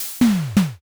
press

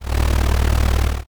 cat purr

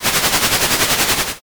comm noise

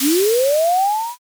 firework launch

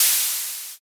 air release

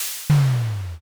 hydraulic

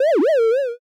bayoon

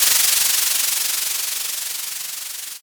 sparkler

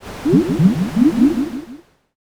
poison

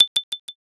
hyoshigi

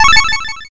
power up

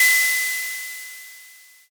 ride cymbal

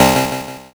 zap

